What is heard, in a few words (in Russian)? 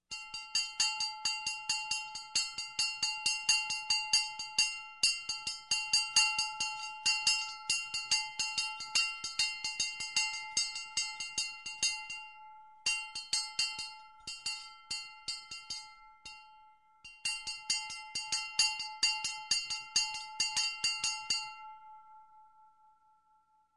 Kolokolchik korabelny; корабельный; Любой звук колокольчика, корабельного, музыкального, маленького, большого, одиночные удары; Колокольчик корабельный №2 — 22 сек